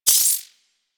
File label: Glass Shotgun Shot Prime Layer C; Warframe; Tenno; Weapons